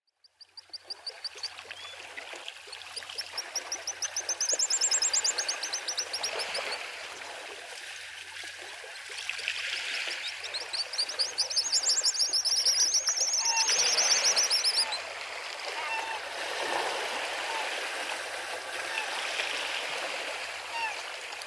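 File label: spectacled guillemot, shiretoko; keimafuri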